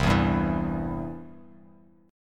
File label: C Major, Bass Standard; strummed; JGuitar